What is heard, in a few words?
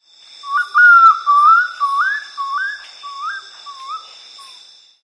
orientalbayowl single phrase